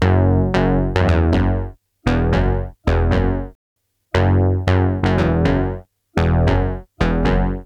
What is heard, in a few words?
Chorus Bass; Multitrack; Remix Parts